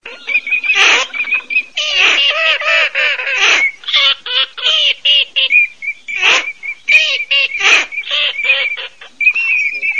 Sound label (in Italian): ALZAVOLA MORIGLIONE